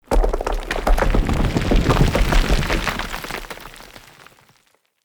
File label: Falling Rock Small; Level Events; Undercity